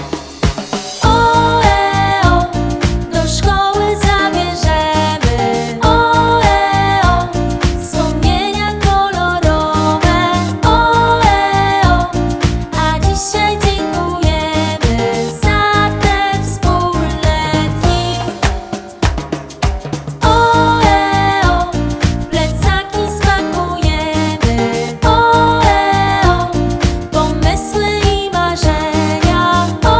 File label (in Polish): wok